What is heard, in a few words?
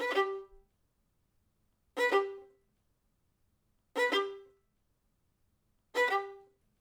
violin